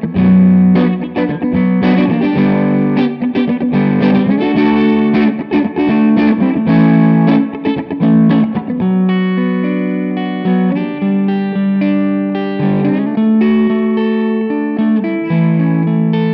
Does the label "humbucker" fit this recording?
Yes